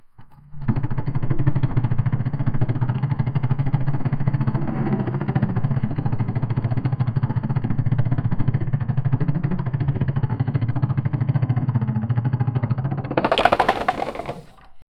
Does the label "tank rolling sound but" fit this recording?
Yes